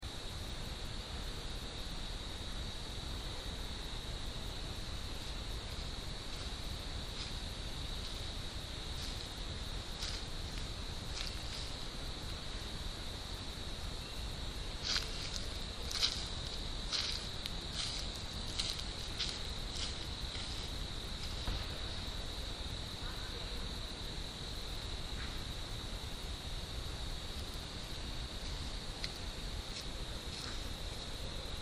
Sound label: walk up; Walk-up recorded 10:38PM 4-05-13